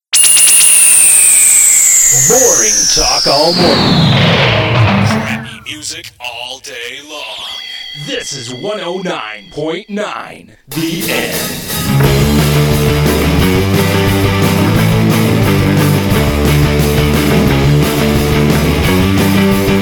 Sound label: bumper w music